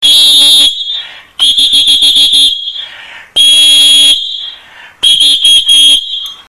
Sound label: tornado